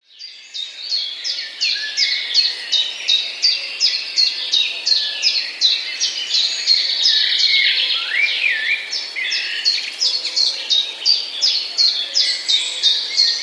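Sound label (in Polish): pierwiosnek, oprócz pierwiosnka, który jest na pierwszym planie, wyraźnie słychać kosa, ziębę i kukułkę; Zaczynają śpiewać jeszcze przed wschodem słońca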